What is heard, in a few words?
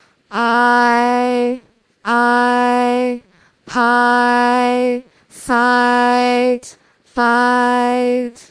singing